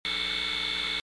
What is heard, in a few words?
move; physioex9